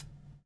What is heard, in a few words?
BUBBLE; Spectrasonics - Backbeat